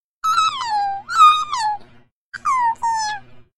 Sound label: lemon alert; babylemons